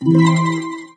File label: treat toss; There; ThereClient